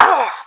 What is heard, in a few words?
pain; action-quake2